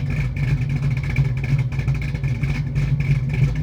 car idle; Matt Hoffman's Pro BMX 2; XBOX

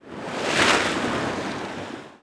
wave; Tony Hawk's Project 8; XBOX

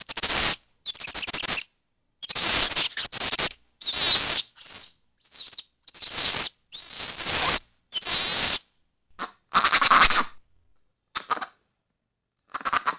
single monkey; animal; Tony Hawk's Project 8; XBOX

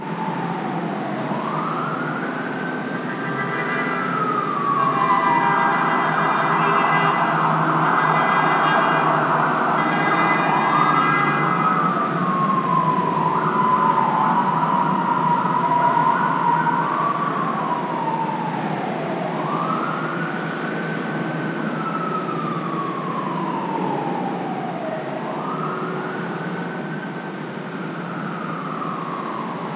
city heavy siren; ambient; Project Gotham Racing

